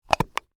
Plastic Case Close, Foley; Snaps; Sound Effects:Objects

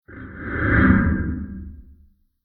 Spooky Horror Transition; Scary Soundscape Sound Effects; Scary Soundscapes (Sound Effects:Horror)

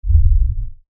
LFE Tremor, Lfe Tremor Low Bass Shake Rumble; Rumbles; Blastwave

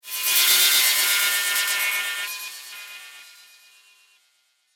Electric Laser Chaos, laser sound

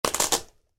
Velcro: Peel, Fast; Miscellaneous Household Sound Effects; Miscellaneous Household (Sound Effects:Household); Stuart Duffield

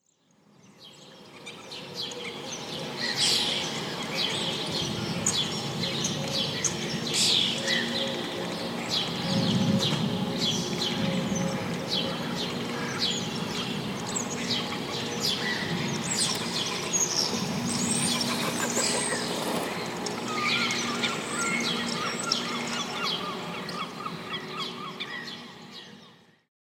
Birds Pigeons And Gulls; Bird Sound Effects; Outside Ambience Sound Effect; Andrew Fleming

